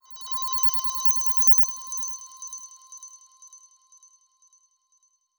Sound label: flutter